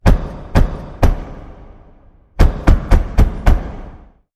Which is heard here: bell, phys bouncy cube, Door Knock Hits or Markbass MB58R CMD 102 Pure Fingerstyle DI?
Door Knock Hits